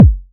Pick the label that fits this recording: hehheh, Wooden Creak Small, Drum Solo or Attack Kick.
Attack Kick